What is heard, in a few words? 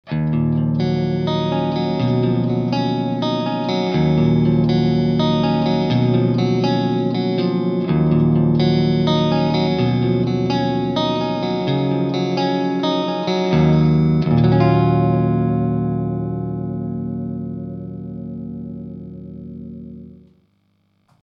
Chorus CF7, Ibanez Guitar Effects Pedals